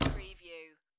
Poker Chips Place Stack Of Chips On Another Stack Wood Table